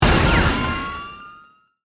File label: Burst, Sweeper, Production Element, Imaging Element, Accent, Transition; Sweeper Sound Effects